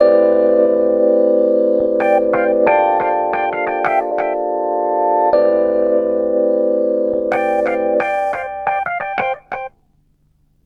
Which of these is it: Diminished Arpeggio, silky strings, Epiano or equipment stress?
Epiano